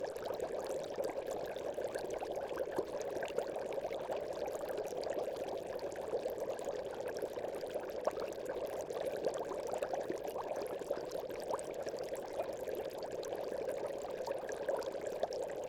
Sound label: Dry Ice Bubbling In Water